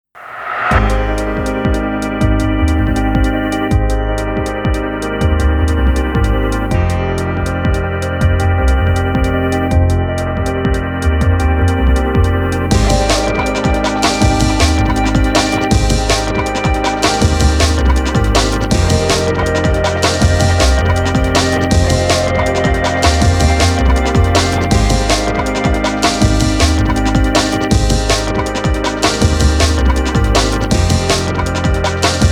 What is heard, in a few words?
Dreamy Loops; resident evil 5